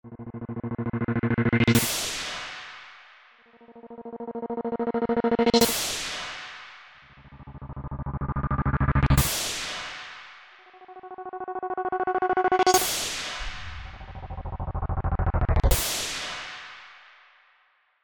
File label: Swell, Swells; Green Oak Crystal